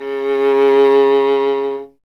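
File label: strings viola C; Miroslav Vitous; Solo Instruments VOL-1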